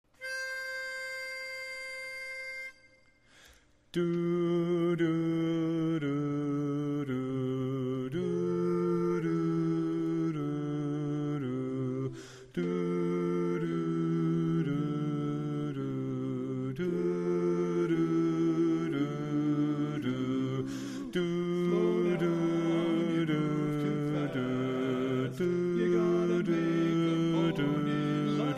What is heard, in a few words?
Bass